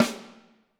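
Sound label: snare drum, Drums; Bob Clearmountain Drums I; SD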